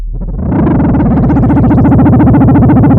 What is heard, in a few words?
pop, Sound of a mud pump